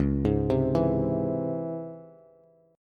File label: C sharp Minor Major 7th C sharp Bass; C#mM7 arpeggiated; JGuitar